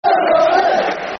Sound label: Football Chant (Soccer Song); Sky Blue Army; Coventry City Ccfc